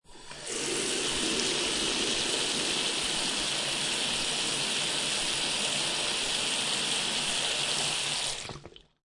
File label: Bathroom Water Tap; Water tap, turn on, water running into sink, turn off; LynneMusic